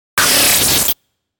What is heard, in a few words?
Warp Blip Fast Stutter Glitch, Flutter Whoosh Right To Left; Warped; Blastwave